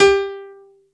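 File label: piano G3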